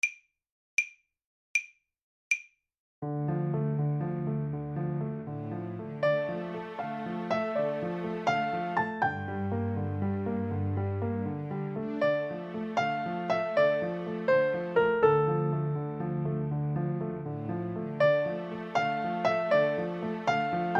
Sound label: Pianotrio